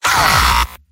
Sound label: pain sharp; vo; heavy; tf2; mvm